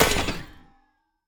death, coppergolem; Minecraft

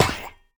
hurt, coppergolem; Minecraft

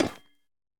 break, copper statue

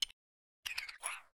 spin, coppergolem; Minecraft

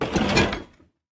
copper chest oxidized open

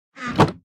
chest close

